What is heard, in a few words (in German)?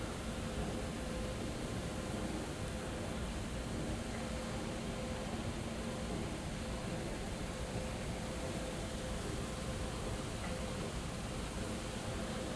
rolltreppe, darmstadt hbf